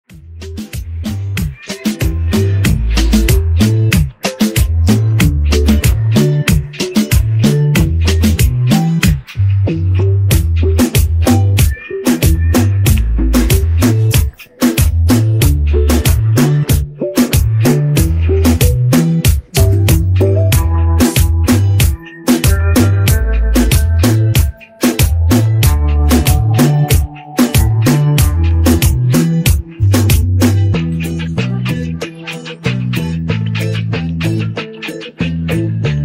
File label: Instrumental